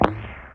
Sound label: thump spinner shot; gonner linux macos ps4 switch windows xbox one gamerip 2016